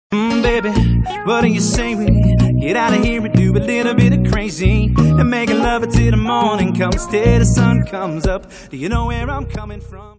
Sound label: ringtone